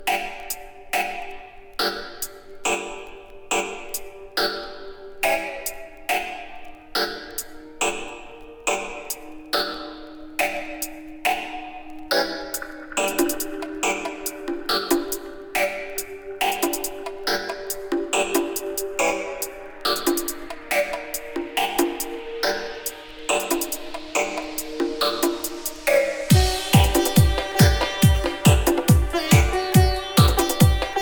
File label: sitar dub